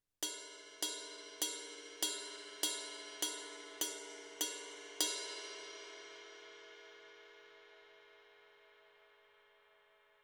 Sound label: 20 RIDE BELL, cymbals